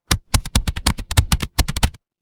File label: office keyboard